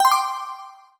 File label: Note Collected; Dub Dash